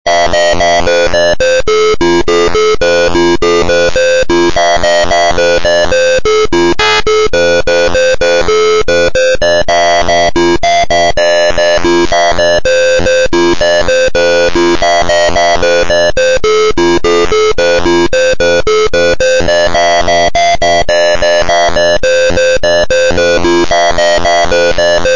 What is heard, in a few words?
acid drop